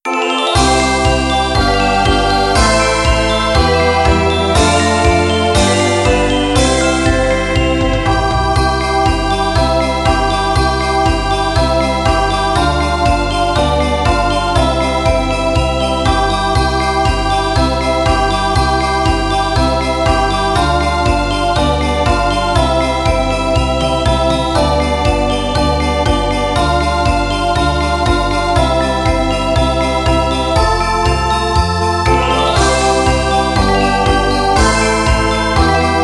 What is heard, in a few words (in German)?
kinderlieder ihr kinderlein kommet playback